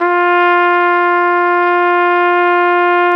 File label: Flugelhorn; Roland L-CDX-03 Disk 2